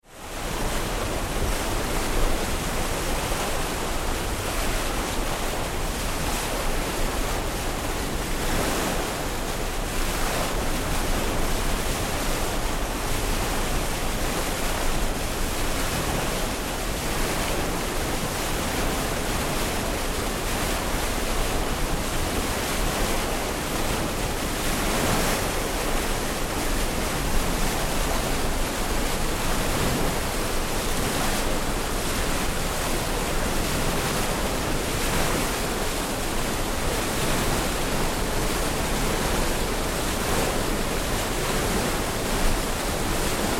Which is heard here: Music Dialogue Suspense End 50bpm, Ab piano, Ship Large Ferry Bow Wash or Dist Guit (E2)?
Ship Large Ferry Bow Wash